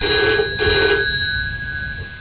old phone ring; Motorola MPX200

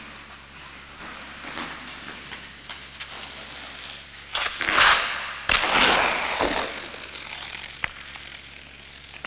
ice skating; Motorola MPX200

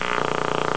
interference; Motorola MPX200

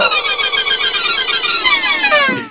cartoon spin stop; Motorola MPX200

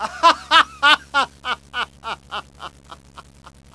laughing man; Motorola MPX200

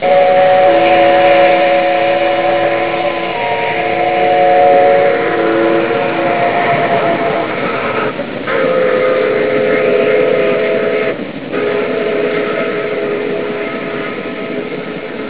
train steam whistle pass; Motorola MPX200

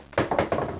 pool pocket; Motorola MPX200

